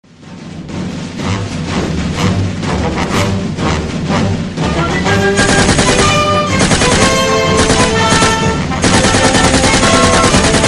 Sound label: combat theme mix ringtone